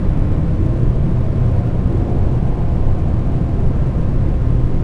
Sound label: assembly, ambience; cairn